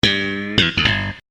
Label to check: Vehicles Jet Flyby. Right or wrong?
Wrong